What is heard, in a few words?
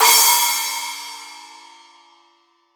Y9 Splash; Akai MPC500